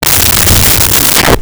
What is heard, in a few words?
Creature Growl, Horror